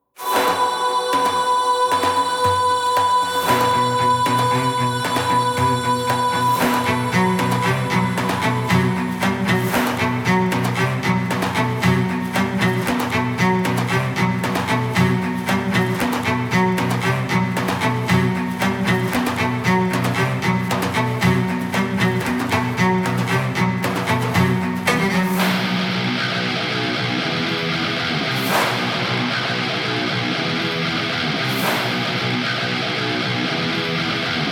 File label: Boss Fight